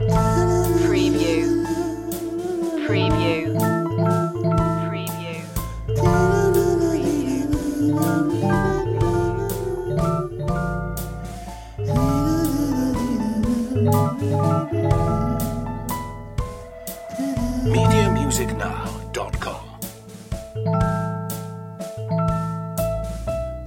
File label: lonely waltz loop